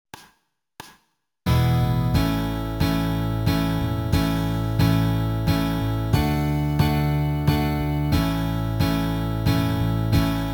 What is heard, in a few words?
amazing chord; guitar